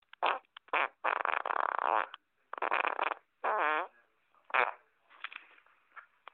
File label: Fart Rasp